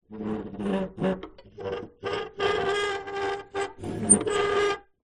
metal lever or hinge rusty old movement squeak creak; zapsplat; foley